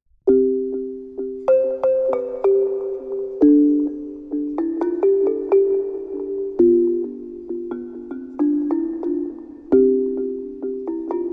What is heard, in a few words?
Stone Music